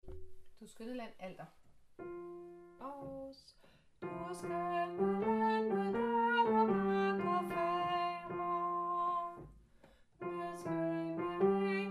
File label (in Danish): Du skønne land ALTER